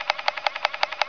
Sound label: tickky whir; environ; Gunlok